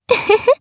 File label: Laugh; kingsraid; jp; Lewsia